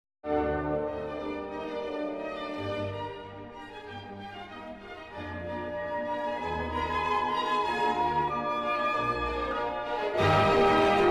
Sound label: mozart brick walled; commercial recordings